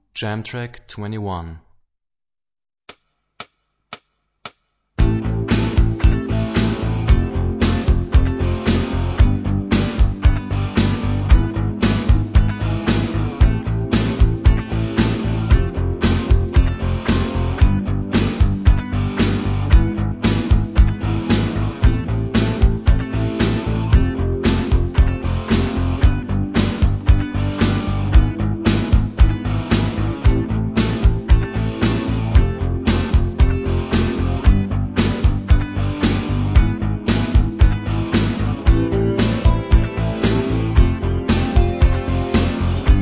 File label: Rock guitar; Rock gt Secrets